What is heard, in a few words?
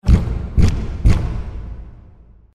bird walk; trex; The King Of Dinosaurs